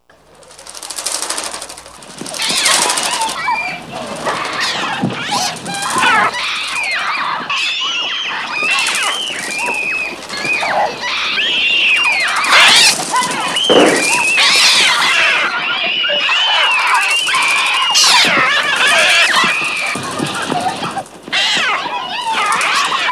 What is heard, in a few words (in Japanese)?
鳥 (Trautonium)